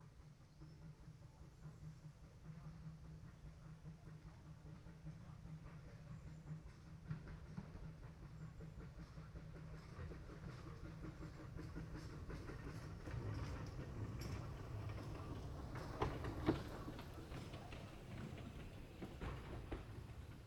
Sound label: Vehicles Steam Train Passing By Stereo Binaural